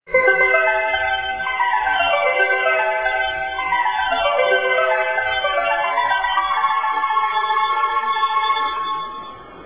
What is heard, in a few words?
water, melody